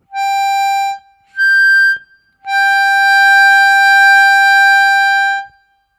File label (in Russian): +6+9, октавы, губной гармошке; блокировки языком